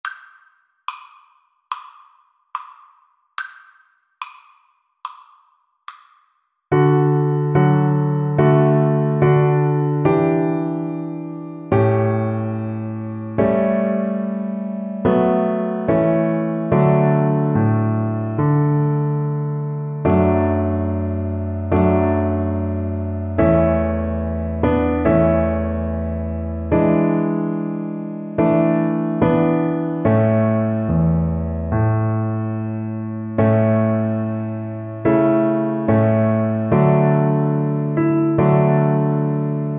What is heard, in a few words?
onward christian violin